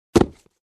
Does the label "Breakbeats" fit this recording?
No